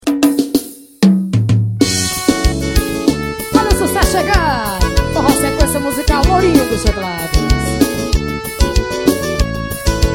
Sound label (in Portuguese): teclados trilha